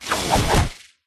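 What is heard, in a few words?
pterodactyl attack middle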